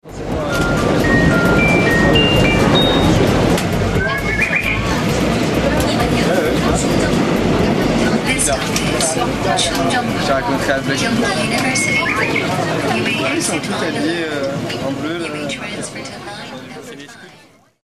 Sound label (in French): jingle oiseaux metro